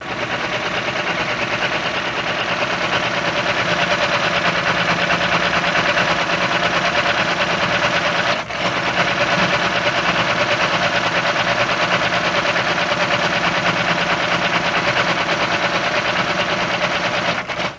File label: machine; WAV Sound Effects; office; computer